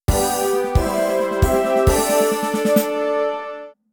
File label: gui victory; Rescue 2013 Everyday Heroes